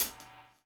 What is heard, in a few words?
DOWN, DOWN KIT 78